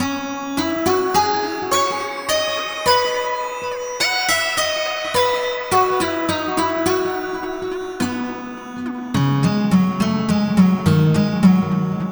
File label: guitar; collasian; fusion dance for omnisphere by 12mx media